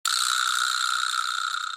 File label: Vibra Slap